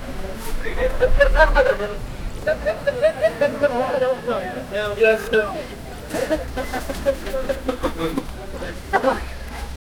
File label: straining sound clothes; chuckling